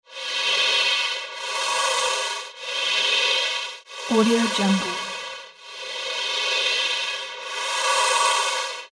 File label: synthesized breathing; human sounds